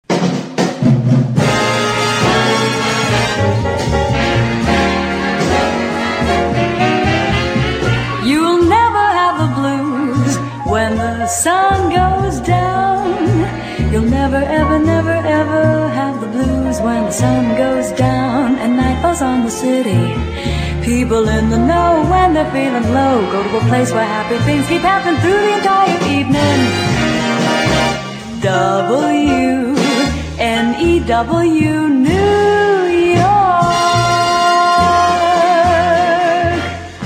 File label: Radio Jingle Blues short; WNEW AM 1130 NY